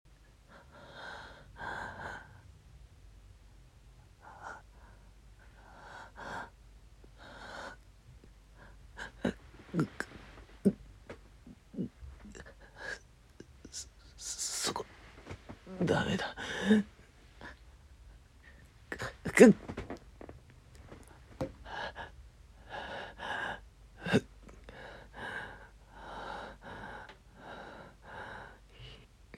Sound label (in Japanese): 低音ボイスの喘ぎ声